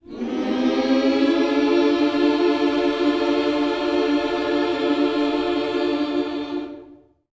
MINOR, MIN.SCOOPS, Vocal Planet, Gospel

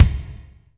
Kick, Alesis DM5

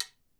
HIT, Percussion; Miroslav Vitous - Percussion & Harp

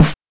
BANK1 SD, NES DPCM; MIDINES

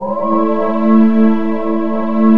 AMBI PAD

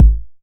909 BD; Goldbaby Tape808 & Tape909; The Tape 909

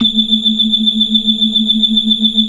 69 BLOOD R 1, ORGAN; Keith Emerson Signature Series

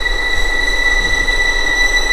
ANGELIC B5

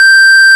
ORG Farfisa; Roland LCDP09 Keys of the 60s and 70s 1; Farfisa Clar

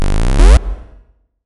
Bit Zipper C2, FX, Club Techno

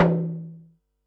tom, Pearl SC-40 Toms

